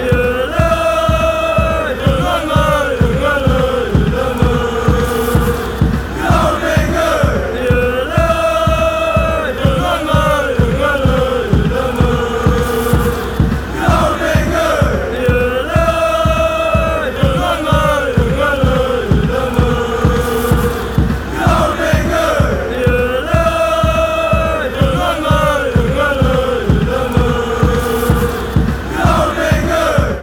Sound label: jolo groningen slow